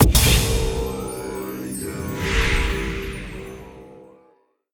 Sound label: bonus Pick; jumanji